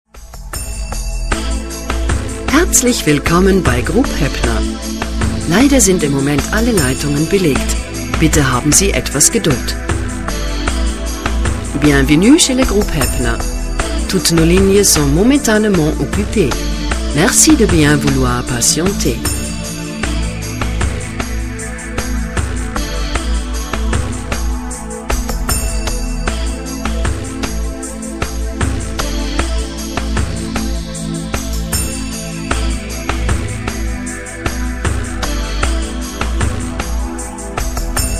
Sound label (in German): warteschleife; Heppner